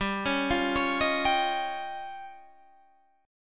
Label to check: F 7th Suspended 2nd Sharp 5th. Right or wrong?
Right